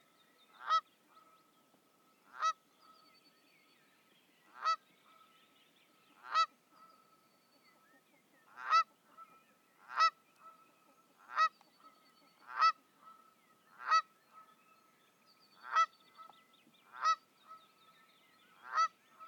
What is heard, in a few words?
Canada Goose Branta canadensis